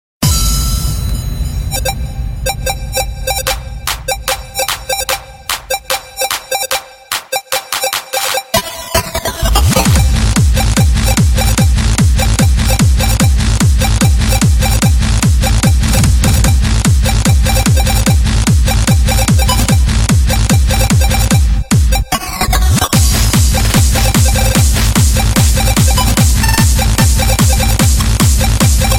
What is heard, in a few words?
nintendo hardstyle